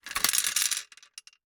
Gumball machine, metal, glass, candy, switch, mechanism, rotate, dropping, wide; Eiravaein Works; Vaeyan IV